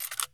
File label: weap bolt handle in; Escape from Tarkov